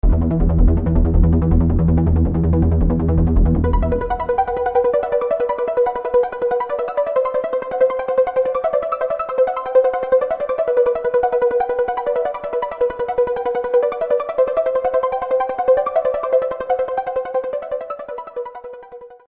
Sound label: arpeggio